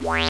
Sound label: yamaha cs-5, synths; Zoom - Release 2 (1996); Active Software